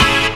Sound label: HIT, Hits & Brass